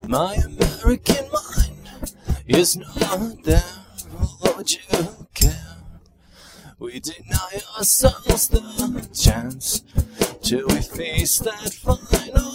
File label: percussive; Phase based harmonic percussive separation; reference algorithm